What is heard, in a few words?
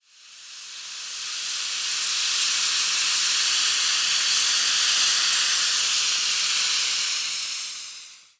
gas